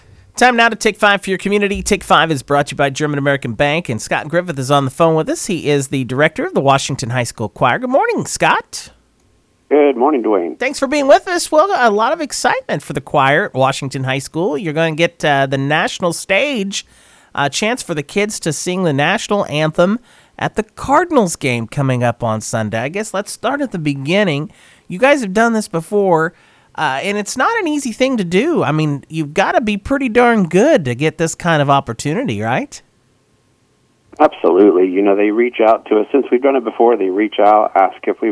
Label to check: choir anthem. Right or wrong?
Right